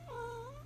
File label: Meow